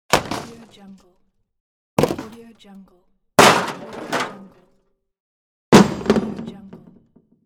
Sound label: plastic hit